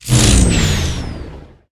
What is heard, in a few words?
Vehicles Hoverboard